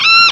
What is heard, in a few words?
squawk; PUZMANIA